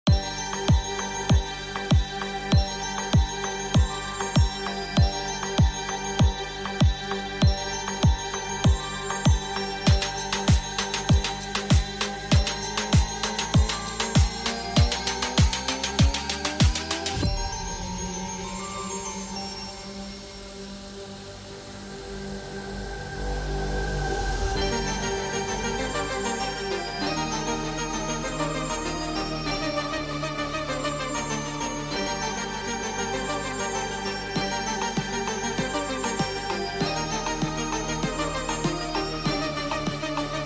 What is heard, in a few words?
Bells